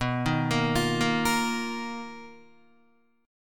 B-Major Sharp 11th B down Guitar; BM#11; arpeggiated; JGuitar

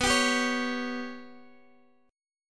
B Suspended 2nd Sharp 5th down Guitar DADGAD

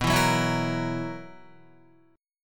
B Major 7th Suspended 4th, Guitar; BM7sus4; strummed

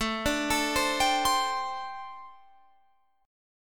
G Suspended 2nd Suspended 4th

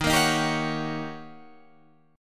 D sharp 7th Sharp 9th, Guitar, Open D

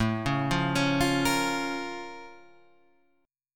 A sharp Minor Major 7th

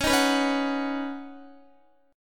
Db 7th Suspended 2nd Suspended 4th, Guitar; x,16,13,16,13,13; Db7sus2sus4 strummed

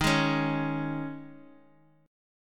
D sharp 7th Suspended 4th; D#7sus4 strummed; JGuitar

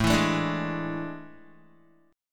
A Major 7th Suspended 4th, Guitar; AM7sus4; strummed; 5,7,7,7,8,x

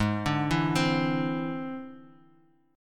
E Augmented 7th Ab

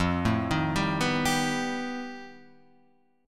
F 9th Flat 5th F down Guitar Drop D

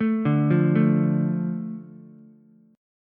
A Suspended 4th Sharp 5th, Ukulele; Asus4#5; arpeggiated; JGuitar

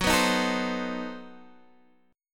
B 7th Flat 9th, guitar

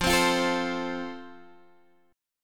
F Major, Guitar, Half Step Down

